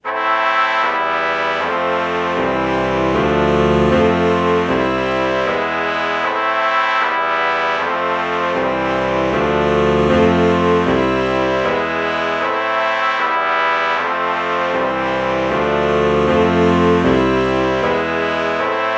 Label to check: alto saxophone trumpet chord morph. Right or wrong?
Right